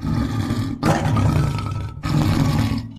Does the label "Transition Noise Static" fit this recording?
No